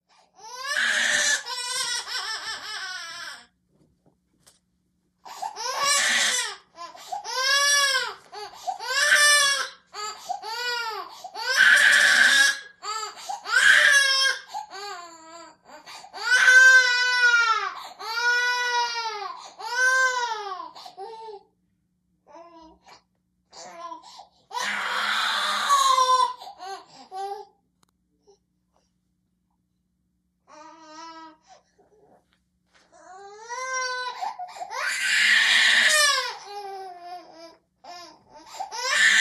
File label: Baby Girl Cry Screams, twelve month old